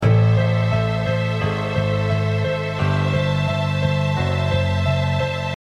cliché bass

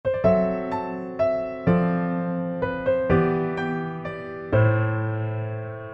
IVsm7b5

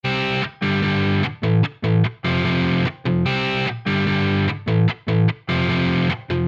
punk power

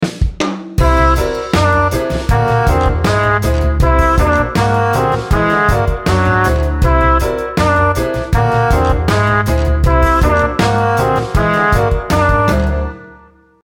whole tone jazz

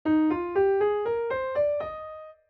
scale Eb maj

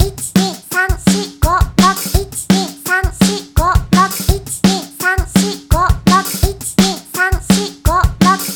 police

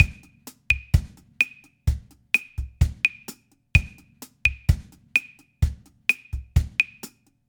clave bossa 2 3

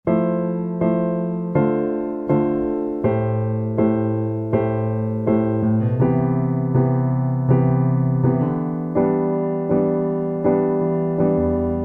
C3 9th piano

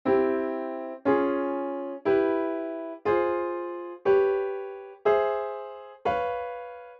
arabic diatonics

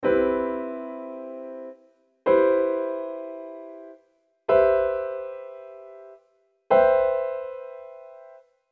C maj7 4 way close

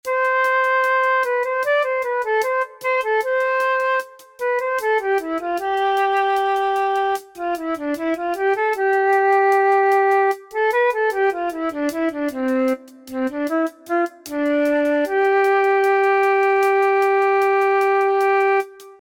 bolero square

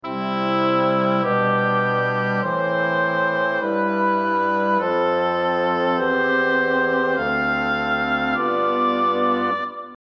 VII cannon winds

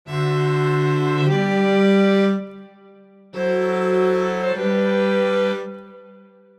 VII dd9 V

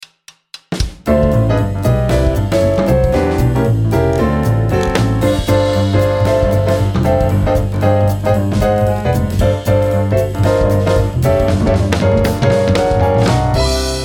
IV D Pedal

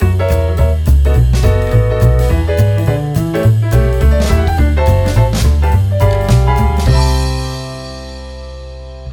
extended dominant

